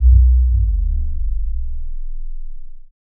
speaker test lfe; Steam

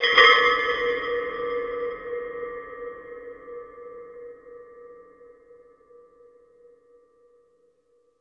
SUZU; Yume Nikki

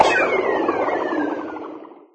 drink bubble; There; ThereClient

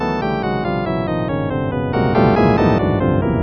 music

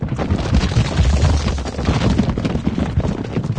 grass dirt crunch loop; There; ThereClient

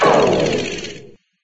hovercraft celebration; There; ThereClient

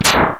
Jet Pump

